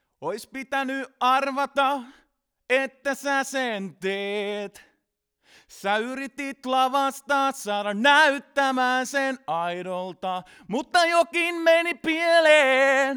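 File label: male vocal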